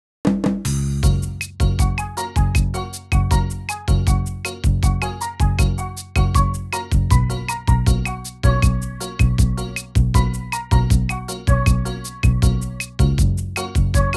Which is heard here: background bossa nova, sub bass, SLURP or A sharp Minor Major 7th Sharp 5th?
background bossa nova